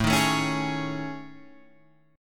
A Major 7th Flat 5th, down Guitar Drop D

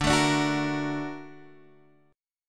A sharp Augmented Major 7th D

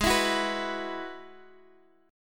Ab Minor 7th Sharp 5th, Guitar, Standard; strummed; x,x,6,9,7,7

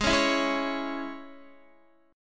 Ab Suspended 4th Sharp 5th Guitar, chord; Absus4#5 strummed; x,11,11,9,8,8; JGuitar

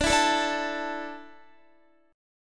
D Suspended 2nd D, down, Guitar; Dsus2; strummed

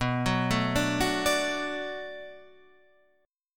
D 6th B, chord

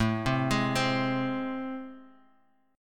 A Minor Major 7th, down, Guitar, Half Step Down

